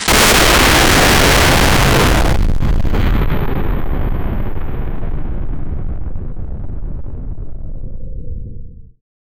the sound of very much, crash

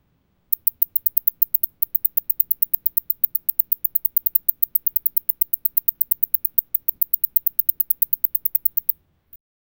sound of a rat in, rodent

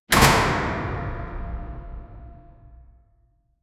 victory vault slam a heavy

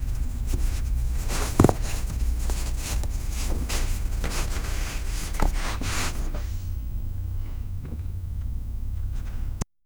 card play

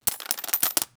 close up sound of coins c

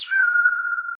abyssal bird cry eerie ec, swoop

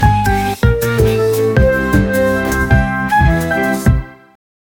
funny challenge music

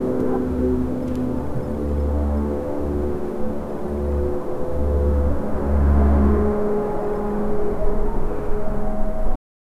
inside container silence; airport

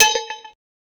create a impact sound, room

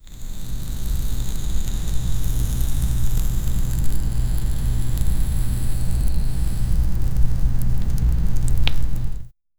lava burns

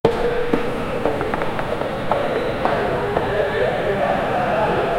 multiple people running a, overlapping voices

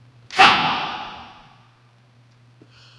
muay thai finisher heavy, martial arts

